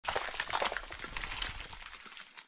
despawn; smokey; scp cb; 106; slender

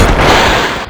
jump; freak fortress 2; ultrakill; minos prime

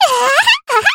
laugh happy; voice packs

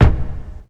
granny footsteps; zerosiki; slender

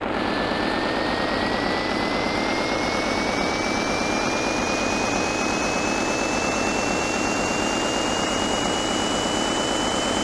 bullet fly; tf2ware ultimate; mariokart

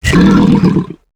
thumper attack sound; lethalcompany; zerosiki; slender

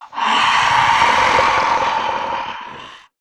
female zombie sighted; nmrih; zerosiki; slender

